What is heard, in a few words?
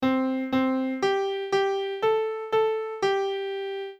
short melody example